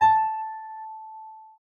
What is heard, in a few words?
piano syn; possiamo ascoltare le parziali della nota di pianoforte senza il rumore dell'attacco